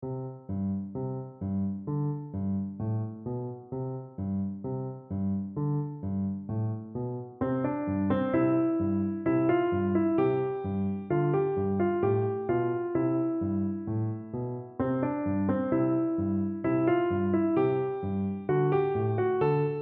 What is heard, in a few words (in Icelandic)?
ragtime, píanó; piano leikur 3 hefti